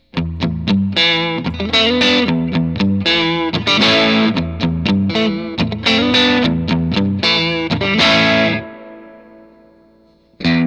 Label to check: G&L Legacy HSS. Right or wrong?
Right